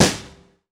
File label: FX Snare